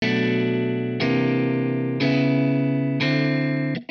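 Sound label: amp guitar chords, Hybrid neural guitar overdrive; honeybee; Morphdrive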